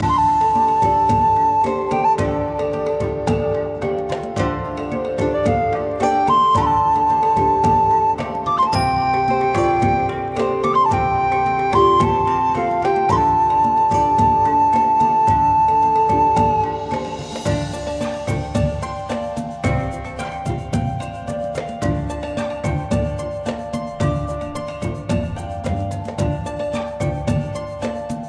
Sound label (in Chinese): 玩游戏中; 欢乐麻将